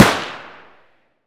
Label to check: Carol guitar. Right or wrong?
Wrong